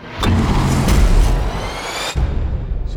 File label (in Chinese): 震撼出场; Aircraft-Battle